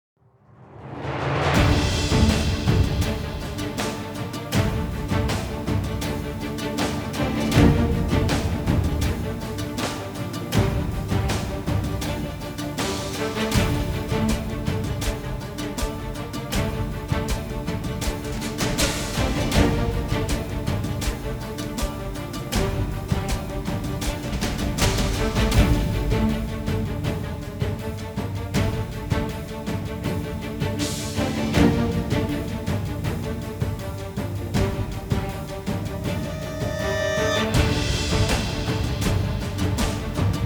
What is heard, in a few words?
Combat; Toussaint; Wine Exchange Combat